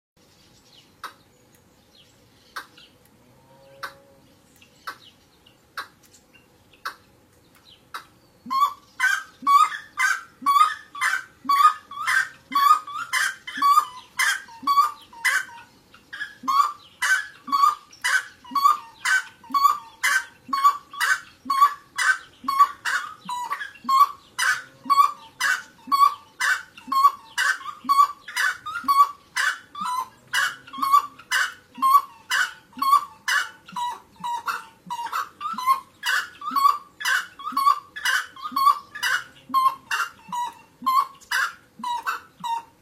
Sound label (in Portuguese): Canto do saracura tres potes